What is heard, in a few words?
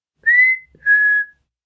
Whistle Yoohoo, child, like calling a friend over (yoo hoo); Whistling; human voice, kid; VoiceBox